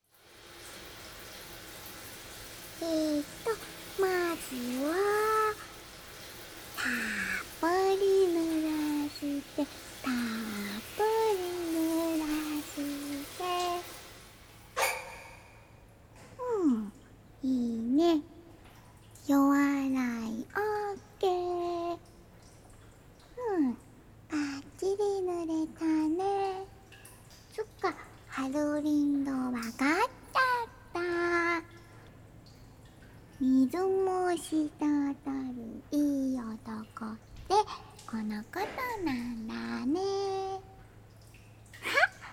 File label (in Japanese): ハーブエキスのシャンプー＆トリートメントでスカルプケア